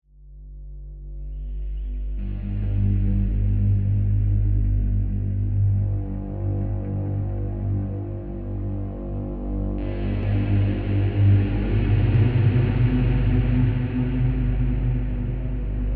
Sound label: Guitar Space